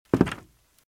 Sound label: Footsteps - Wood - Creaky - Walk - Left